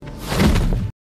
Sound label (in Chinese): 瞬移-落地